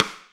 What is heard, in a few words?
Rimshot, Snares